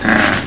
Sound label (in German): BRUMM; 999wavs